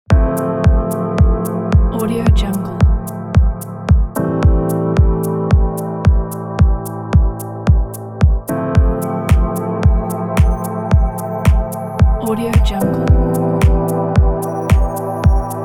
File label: lounge house chilling beat